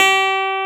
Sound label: clav; FUNK